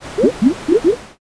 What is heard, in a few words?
poison